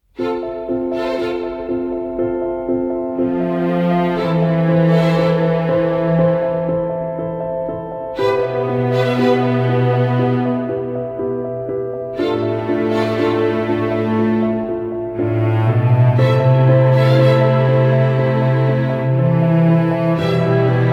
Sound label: Orch; InsideStory; LoveStory